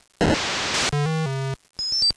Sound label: Ranged Weapon Hit Kobold